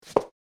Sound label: Concrete Heels Scuff; コンクリート ヒール 擦れ音